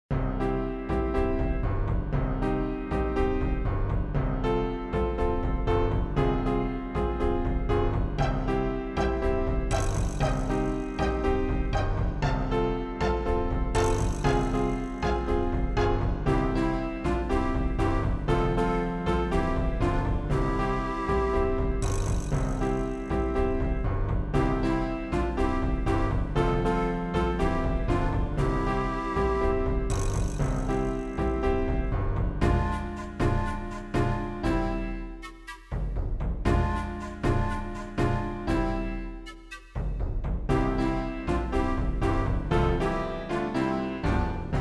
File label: jungle beat